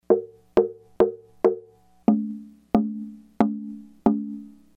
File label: yamaha ls36 tap, guitar taptone